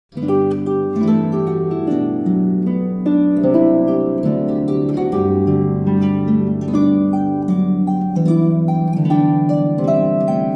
ding dong merrily on high, christmas carols on the harp